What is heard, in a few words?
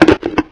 coelacanth attack